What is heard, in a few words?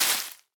Leaf litter break; Ściółka